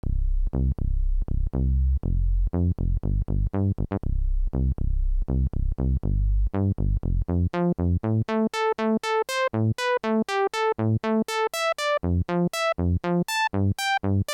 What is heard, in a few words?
yamaha tx7 filter lpf q--- sim